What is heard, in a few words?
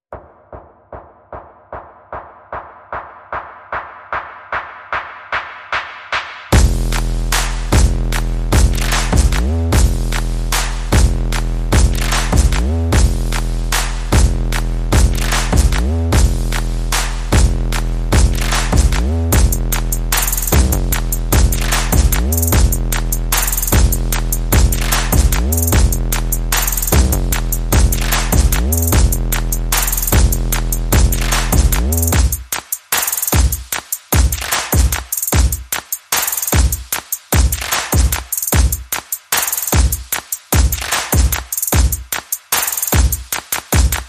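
Bass and Percussion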